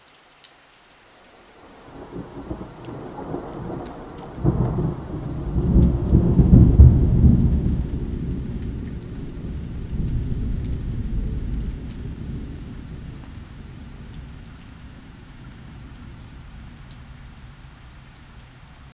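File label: steady rain with occasional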